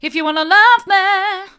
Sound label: hard, vocal